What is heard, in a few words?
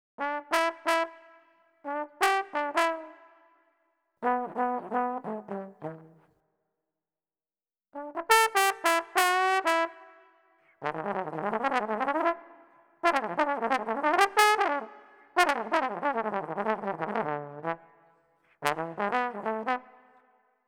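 Jazz Trombone, Cmaj, 089 bpm; Brass; Pro Tools Essential Loops; Digidesign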